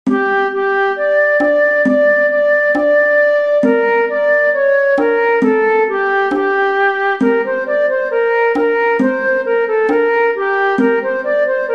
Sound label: Cajon